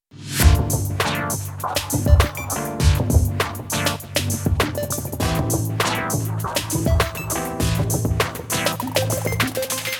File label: relax intro